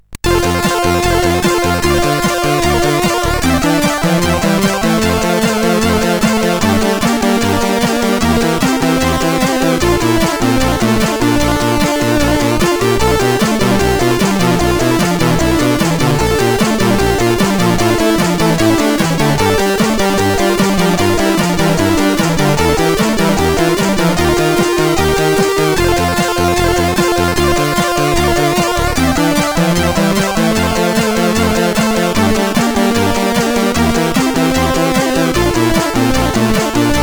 D50 tune, CSG8580; CSG8580R5

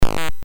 BASIC sid MOS6581R3, GAMES; Terrible Twins

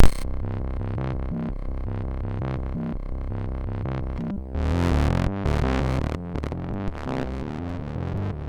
intro fx, sid MOS6581R3

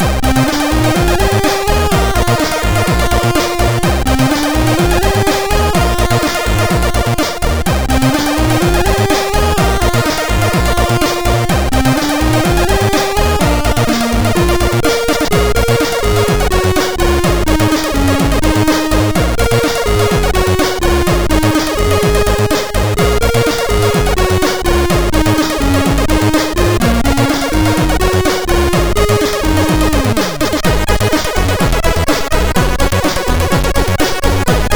Chip Power 4 Mod, MOS6581R4

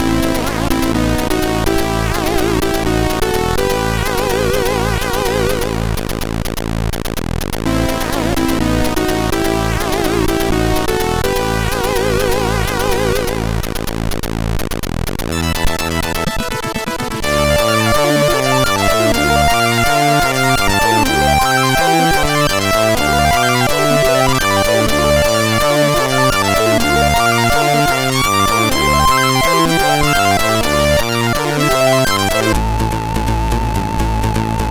Cute Tune sid MOS6581R2

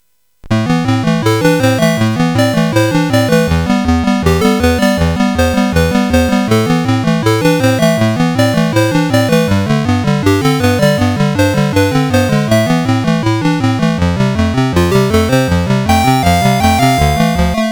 Demo Music, CSG8580R5

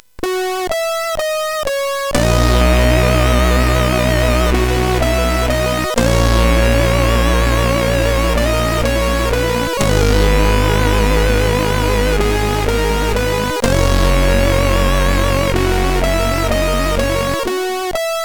Slowy, CSG8580; hvsc

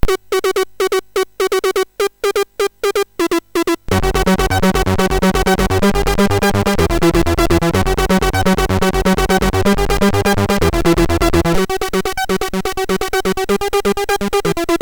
Rave sid MOS6581R3

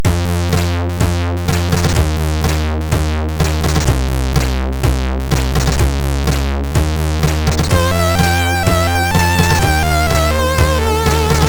Magic Sound, sid MOS6581R3

